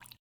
running water, river sounds

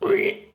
pig calm

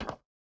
stone step, footsteps

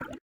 running water, river sounds

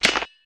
smash klonk; abilities

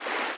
fast water, river sounds

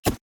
swing sword; abilities

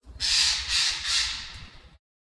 birdcall, ambient

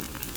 fuse

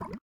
running water, river sounds

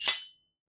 dagger out, weapon

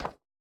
stone step, footsteps

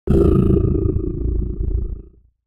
wolf angry

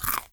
food, consumable

